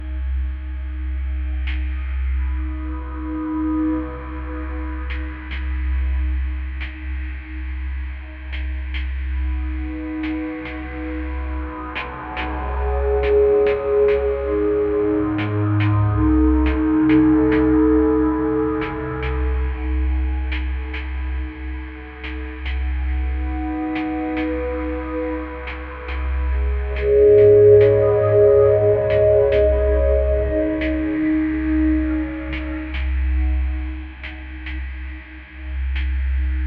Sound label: dark ambient track with